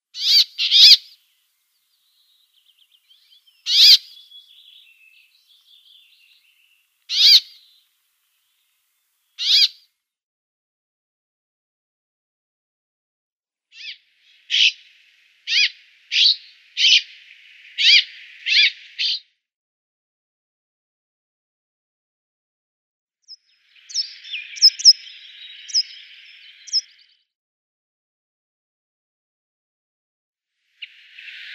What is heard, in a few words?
Brambling calls